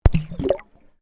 Water Microphone Dunk Shallow Submerged; Submerged splash sounds; Splashes; Sound Effects:Objects

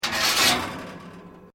OVEN TRAY SMALL REMOVE; Common oven sounds; Appliances; Household

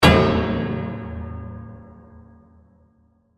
Hit Metal; Production Element, Imaging Element, Accent, Transition; Hit Sound Effects

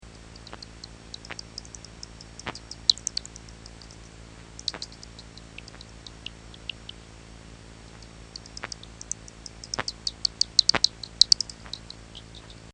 Bat; Bats; Animals; recorded in a custom built sound booth

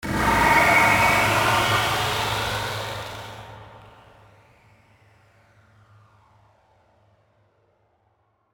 FLANGE ASCEND, Flange Ascend Sweeper; Production Element Imaging Element Accent Transition

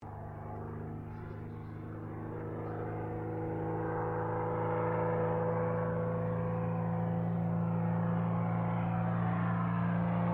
AIRCRAFT CAC CA-25 WINJEEL LOOP, fighter trainer; RAAF Winjeel fighter trainer; Airplanes (Sound Effects:Vehicles)

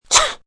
Sneeze Short, Sneeze, female, short; Sneezing; Voice Box

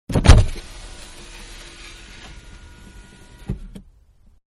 Big Car Auto Window Opening, Interior; Cars (Sound Effects:Vehicles); Car Big-Auto-Window-Opening, Interior

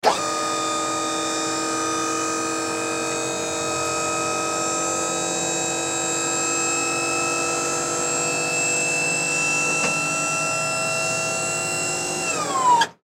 Fire Truck Front Cab Lower Hydraulic; Emergency; Emergency Vehicles

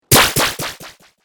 Laser Zap, Laser Gun

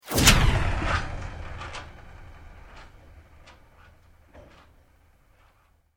Sweep Mechanical Medium; Created sweeps, swooshes and other movement effects; Sweepers; Foundation4

